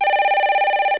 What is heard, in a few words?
page